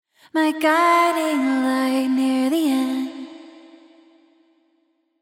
Vocals Rev Vocal Slap

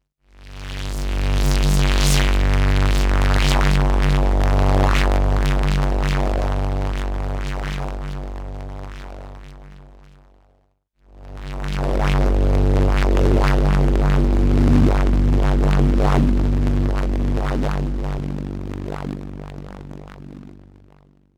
Dreadbox NYX V2

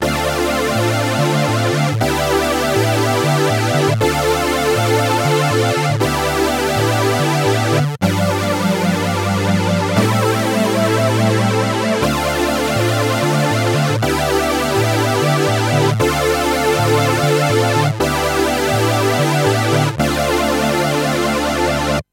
Sawtooth Synth MXR EVH Flanger

